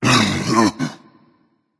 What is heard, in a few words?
grunt hit berserker; cuchulainn